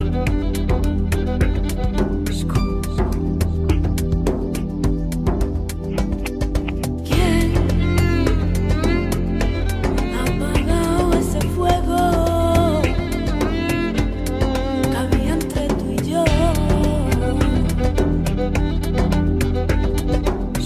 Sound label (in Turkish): yeni tuerkish ask zil sesleri